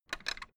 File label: fence gate latch rattle; objekte; tueren